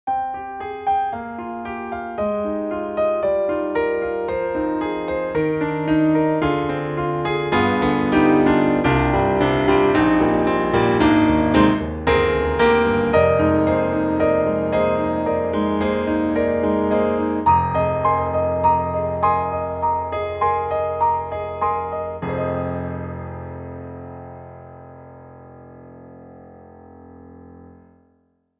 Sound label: CFX concert grand, classic; GARRITAN; Goldberg1981